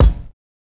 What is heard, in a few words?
Soft hihat kick, Kick drums